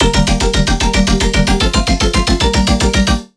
Melodic Bass G 150, One-Shots Bass Hits; Spike; Ghosthack; Color Bass Essentials

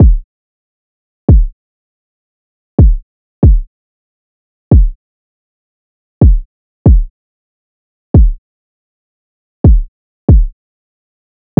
Drum Loop - 140 BPM Kick; Loop Stems; Cymatics; DOPE DRUMS VOL. 1; Pluto

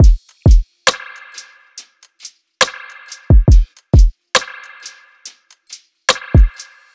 Rings Drum Loop, 138 BPM; Cymatics; Vibes II Drum Loop Collection

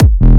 Techno Kick; 30 Techno Kicks 2025; Ghosthack

